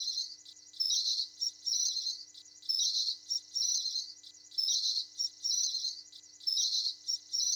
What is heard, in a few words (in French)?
birds aigus